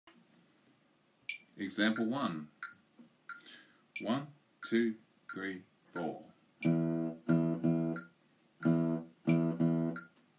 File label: Rhythm; Grade 2